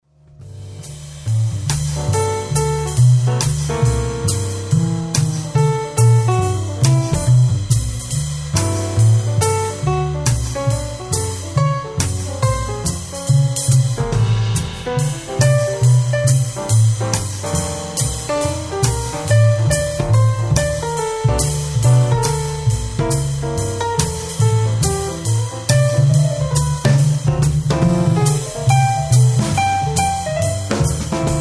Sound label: clear day jazz